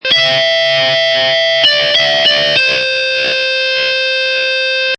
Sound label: Loop Electric Guitar Melody Short High Loop Em 98, Electric Guitar Loop In E Minor At 98Bpm.; Guitar Loops